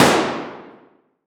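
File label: Weapon Gun Shot Gun 12 Gauge Benelli Automatic Single Shot Interior Shooting Range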